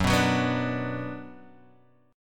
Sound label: F sharp Suspended 4th Sharp 5th, Guitar, Open D; 4,2,4,5,5,x; down